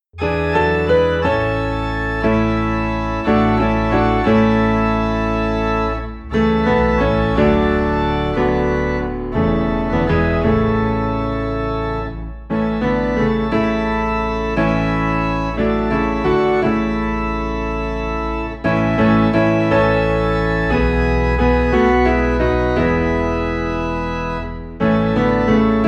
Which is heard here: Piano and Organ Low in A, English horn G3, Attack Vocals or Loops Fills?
Piano and Organ Low in A